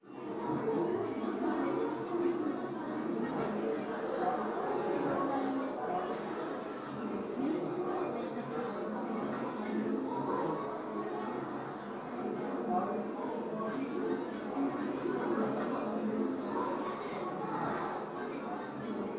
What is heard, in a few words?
Cheer Synth; BGM; Altdeus Beyond Chronos